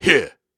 Grunts and Chants, Viking Vocal

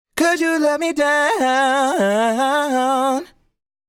Sung Phrase Dry, 95 BPM D Min, vocal; Cymatics; Euphoria Vocal Sample Pack

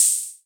Open Hat, Analog Drum Kit; Southside; Cymatics - ANALOG EVOLUTION Beta

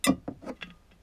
Pick Up Bottle; Fairlight Sound Lib

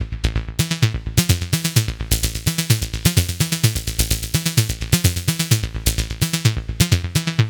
Melodic Loop Amin 128, Melodic Techno; Beyond; Ghosthack; AC2024 - Day 12 - Melodic Techno

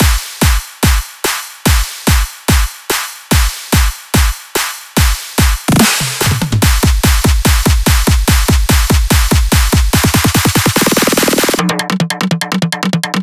Buildup Drum, 145 BPM; Dubstep; Drums; Loops; Cymatics; Dubstep Starter Pack

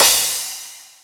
Crash, Dubstep Cymbals; One-Shots; Drums; Petrichor; Ghosthack; Ghosthack - AC2024 - Day 13 - Dubstep Sounds 2025

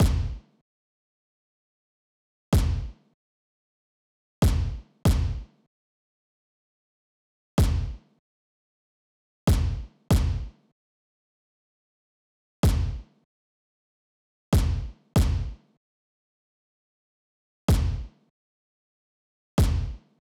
Space Drum Loop - 95 BPM Kick; Loop Stems; Cymatics

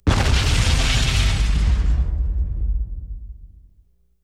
Explosion Large Slight Debris; Explosions

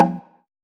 Percussion, House Drum One-Shots; GhostHack; Advent Calendar; House Kit

